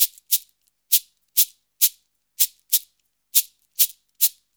Modern Percussion Loops; Soundscan vol.56; AKAI

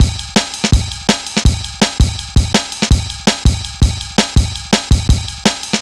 165, drum loops, drum & bass

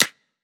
clap, hip-hop drum kit; 99Sounds x Monosounds; Moon

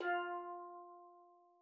F3, STEELDRUMS; Xsample VOL-4

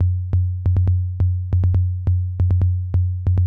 SUB BASS, Techno 138 BPM; Best Service ProSamples vol.54; AKAI; FALLING HI

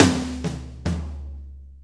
6-8 Fill, Drum Tools

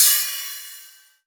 Hi-Hat Open Natural Bass House; WEIRDFUNK

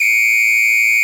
High Whistle, percussion; Roland BK-5

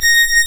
Synclav FM STRINGS A#; Big Fish Audio

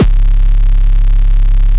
BLOWZY DEEP A, Bass; Distored Reality 1&2

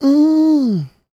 MMM, Vocal Blues Soul; Spectrasonics; Planet

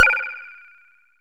FM FX, synth; AKAI S-Series CD-ROM Sound Library VOL-6

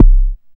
BD ANALOG; Sound & Vision - Gigapack II CD 2

